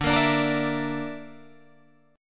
D Suspended 2nd Sharp 5th D, Guitar, Drop D

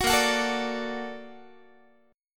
A sharp Augmented Major 7th A sharp Guitar; A#+M7; strummed; 21,18,21,19,18,x

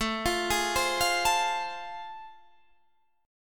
A Minor Major 7th Sharp 5th; AmM7#5; arpeggiated; chordshape; 17,20,18,17,18,17

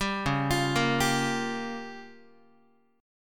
C sharp 6th Flat 5th C sharp Guitar; arpeggiated; 10,9,8,8,11,x

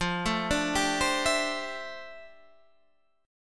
D Minor 11th F

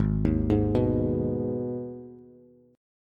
Ab Minor Ab down Bass; Abm arpeggiated; JGuitar

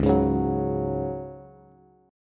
Db Suspended 4th Db, Bass; strummed

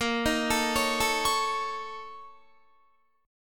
Bb Minor Major 7th Double Flat 5th, Guitar; arpeggiated; 4E,4A,5D,5G,5C,6F; 18,18,19,18,21,20

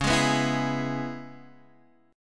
C sharp Minor 7th C sharp Guitar Half Step Down

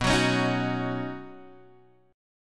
B Major Add 9th B, Guitar, Open G

